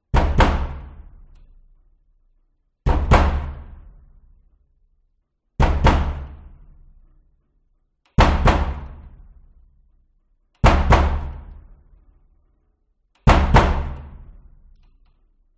Door Abandoned Warehouse Hallway Perspective Reverberating Old Wooden Door Impact; Hit; Skyes Audio